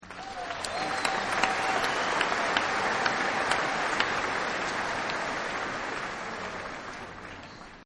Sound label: Light Stadium Applause; Crowds; Partners In Rhyme